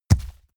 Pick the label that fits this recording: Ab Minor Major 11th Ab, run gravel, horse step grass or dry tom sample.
horse step grass